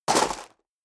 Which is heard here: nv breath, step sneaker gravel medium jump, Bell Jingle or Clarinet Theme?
step sneaker gravel medium jump